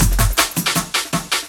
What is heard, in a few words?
Maximum Booty Break; Jungle; Breaks; Blu Mar Ten - Jungle Jungle - 1989 to 1999 Samplepack